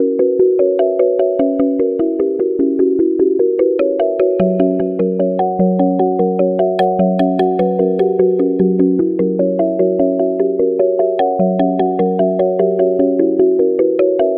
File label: Wood Mallet